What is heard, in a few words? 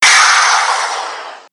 Scratch Sample Ahh; Serato